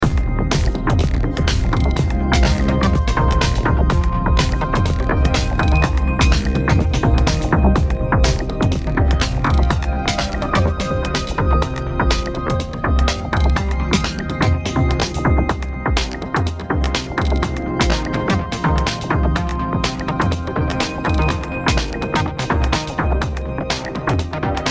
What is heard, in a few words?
Loop